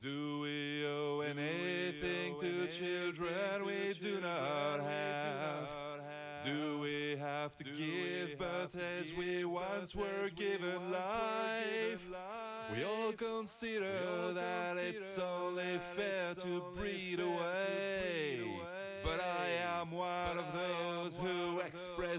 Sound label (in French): vocals lead delay